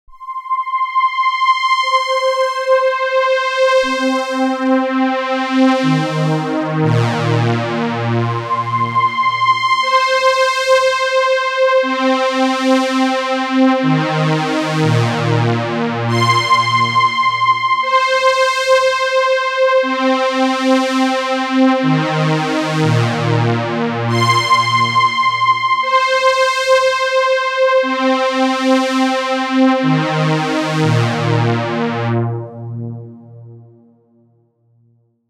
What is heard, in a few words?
BIG Pad STRINGS